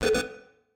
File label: Signal Bed; Game rip; MiSide